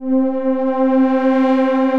Ceremonial Pads, Ethereal Pads; Instruments; Ableton; Mood Reel

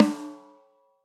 SNARE stick rim shot

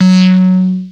Hottbottom F#2; Analog Synths; Ableton Foundation FreePacks

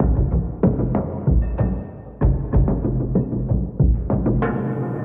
Bins and Barrels Kit 95 bpm, drums; MIDI Clips; Ableton; Mood Reel

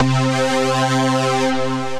Pluck Stab, Synth Lead; Ableton; Factory Packs; Build and Drop

